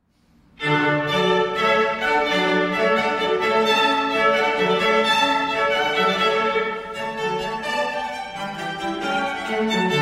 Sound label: Hornpipe String Quartet; Water Music; Handel